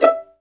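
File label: STR 2 PIZZ E5, Violins Pizz; Vls Pizz; Roland L-CD702; Vlns Pizz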